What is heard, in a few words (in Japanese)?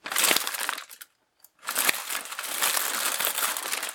gomibako asari